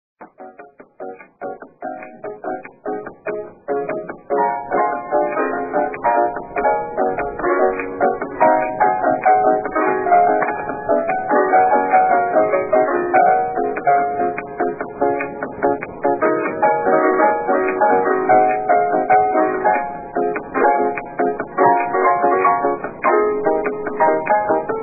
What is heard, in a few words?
bossa nova